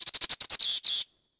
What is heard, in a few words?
houses bird; Tony Hawk's Project 8; XBOX